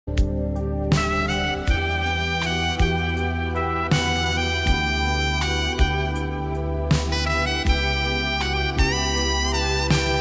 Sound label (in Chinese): 彩铃背景音乐